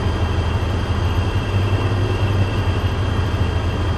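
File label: noise that blocks the ears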